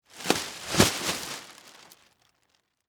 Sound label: Household, Garbage, Bag, Shake, Open